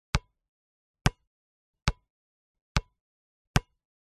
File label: sport basket ball med bounce x4 on asphalt concrete